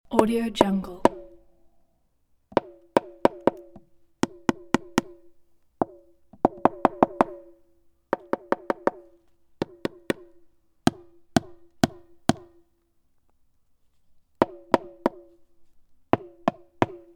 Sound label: hammering setting plastic pipe